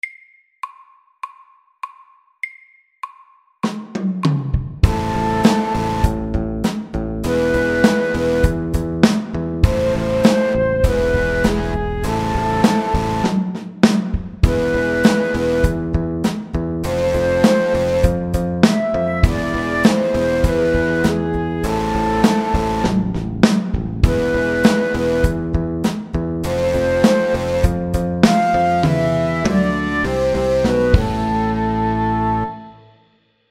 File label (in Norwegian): Elektrisk rock MIDDELS